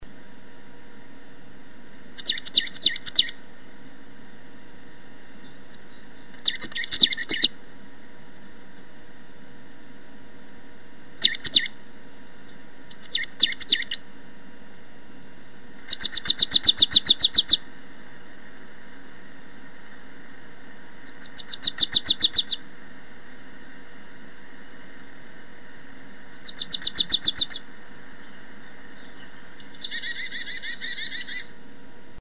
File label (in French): mesange nonnette, oiseaux